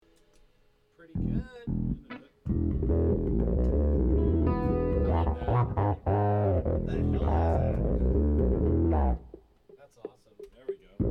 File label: Strings Only